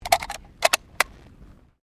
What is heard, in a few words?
RIFLE 303 MK1 MAGAZINE LOAD